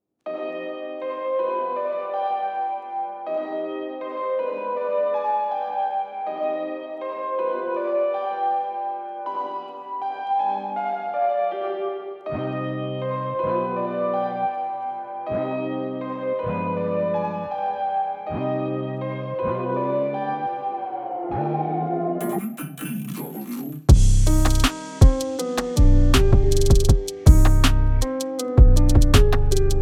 Trap

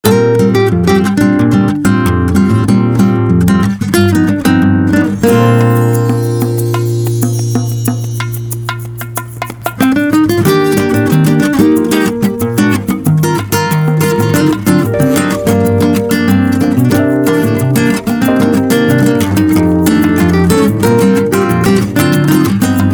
Bossa Guitars

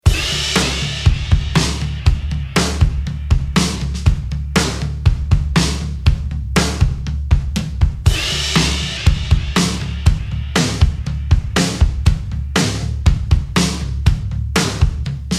Aquaduct, Drums, Ramp, Upstairs; EQD

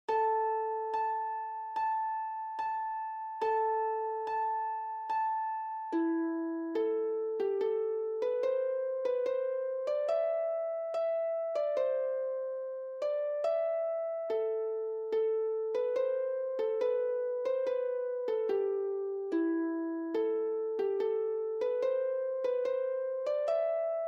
King of the Fairies Harp; Zephyr Rehearsal Tracks

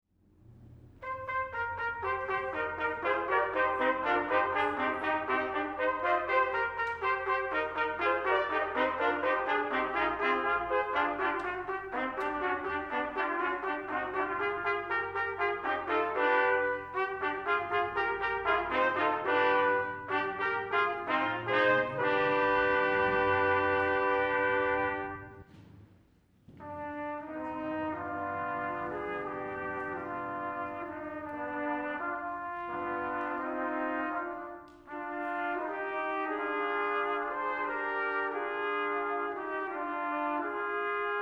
Fanfarria, Martello espressivo

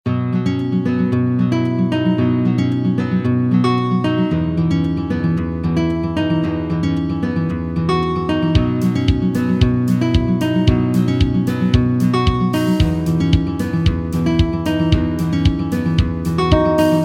Bright

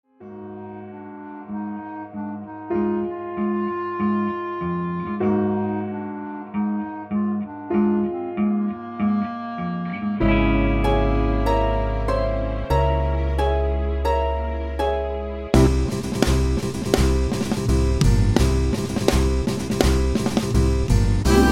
Bed Music Love Song

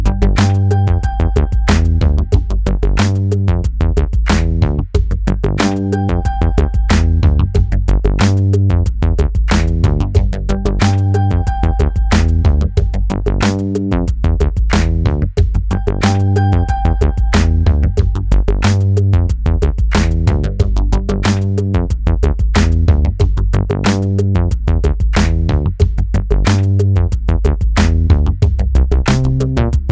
glory instrumental

